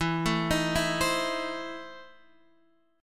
E Major 7th Suspended 4th Sharp 5th, Guitar, DADGAD